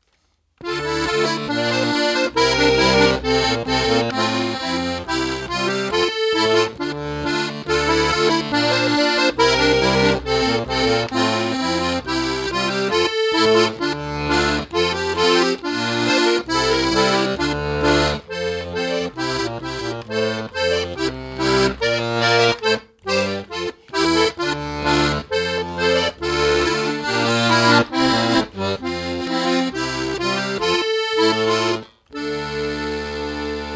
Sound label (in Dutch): meerstemmig, harmonica